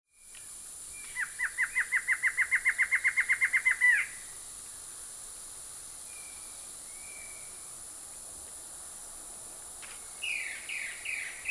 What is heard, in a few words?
Black hooded Antshrike